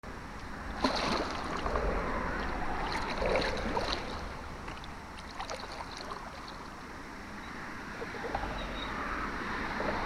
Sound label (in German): paddelgeraeusch komposition